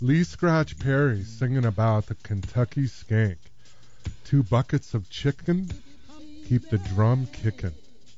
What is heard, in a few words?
drum